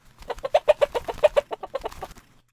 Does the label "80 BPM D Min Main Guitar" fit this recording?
No